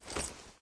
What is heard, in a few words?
angelic step; Smite; Thanatos